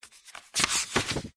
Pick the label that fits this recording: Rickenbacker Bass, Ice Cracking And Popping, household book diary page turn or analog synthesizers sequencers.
household book diary page turn